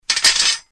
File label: remove Trap